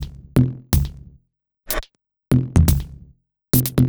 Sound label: Top D 123bpm, House Drumloops; Zero-G House Fabrik Drumloops